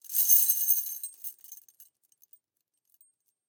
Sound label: Foley Chain Rattle Movement; Frogsong